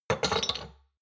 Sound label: shot glasses place multiple soft; soundpool